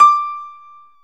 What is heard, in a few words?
PNO Grand Pno; Club-50 - Foundations Roland